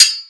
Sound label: sword